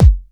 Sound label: Kick; Ableton Live 12 Lite; Core Library; Drums